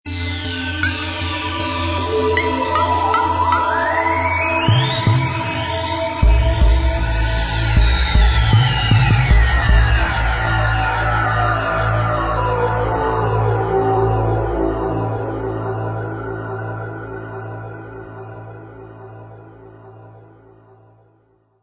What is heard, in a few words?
Music Theme